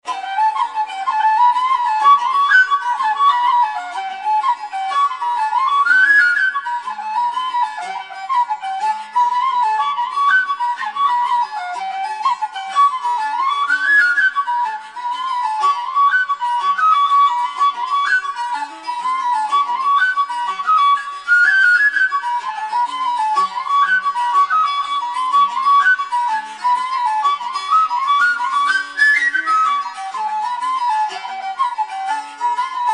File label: Jig; Nora Crionna